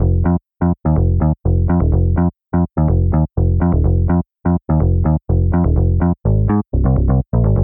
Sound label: Disco